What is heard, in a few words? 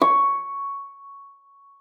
FLAG C6, harp; Xsample VOL-8; HARP+FX